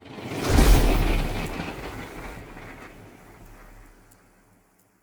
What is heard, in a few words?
ship corvette move; Stellaris; machineage; ships